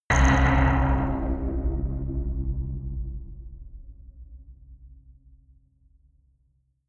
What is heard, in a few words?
bass wiggle short synth; hits explosionen